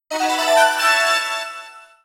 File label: Jingle High Score!; wii fit u